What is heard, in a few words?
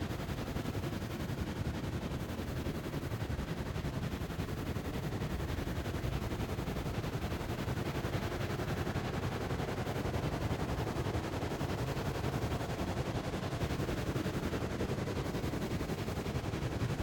Amb Purple Loop